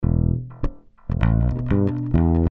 Smack Funk Fretless; Funk & Rock; Loopology; Get Dirty

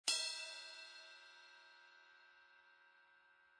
Ride Bell; Lounge & Reggae; Loopology; Ska Till Ya Drah

